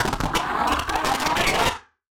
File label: Casio, Glitched, malfunctioning synth